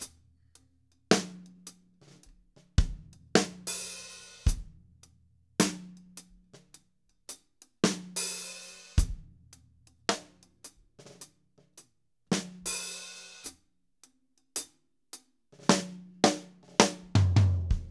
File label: 107 SLOW GRV, Drumscores; Steve Gadd Drumscores; LO BPM